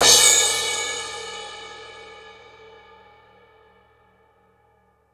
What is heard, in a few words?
Zildjian cym 12